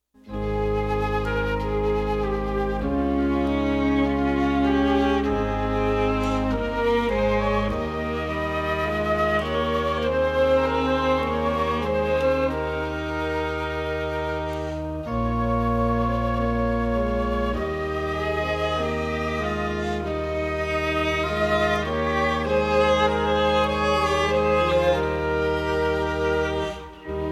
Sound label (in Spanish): Musica Instrumental